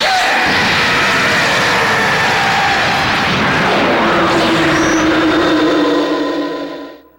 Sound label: Dragon Scream